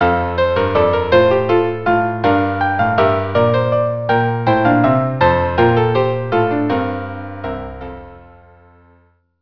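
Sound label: jig violin piano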